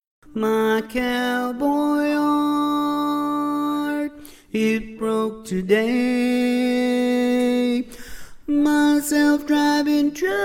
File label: Bari, barbershop